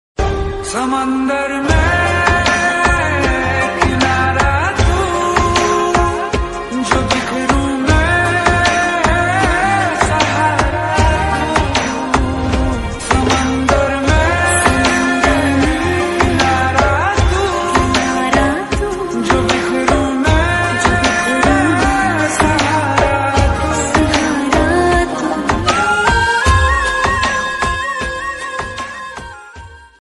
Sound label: romantic ringtone hindi love ringtone mobile ringtone